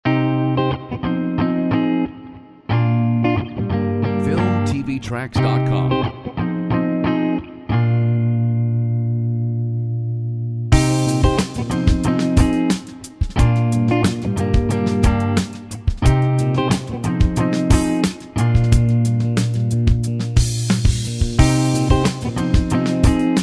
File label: Stroll cheerful